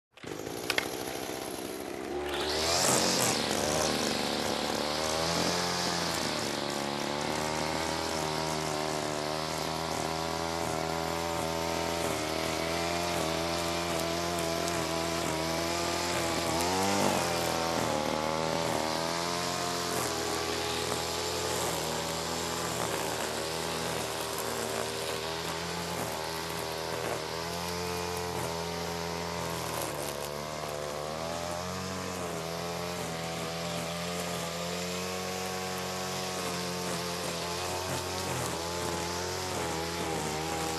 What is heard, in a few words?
Cutting plants